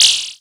DSP Hit

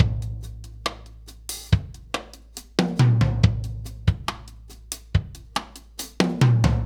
Earth Loop, Drum Tools, Laid Back; Sonic Foundry (Sony Creative Software); Loops & Samples Drum Tools; Gone to Earth

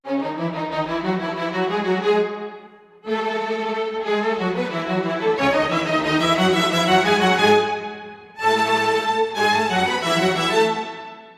90 c# min strings, Orchestral arrangements

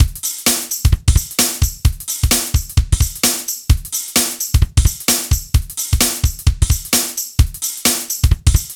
ROCK, Funky Xtreams; INIS

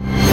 PERC FX, SYNTH PER; AKAI S6000 CD-ROM - Volume 1; PERCUSSION

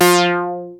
ARP 2600 F5, synthesizer; Hollywood Edge - Giorgio Moroder Rare Synthesizer Collection

